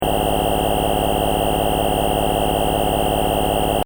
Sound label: Sci fi machine loop, Scifi Machine: Loop, Short; Control Panels; Stuart Duffield